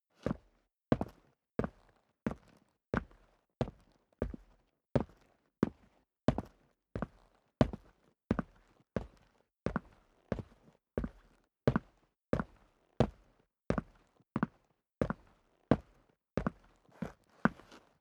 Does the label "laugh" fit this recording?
No